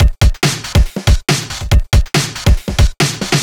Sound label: Breakbeat 140 BPM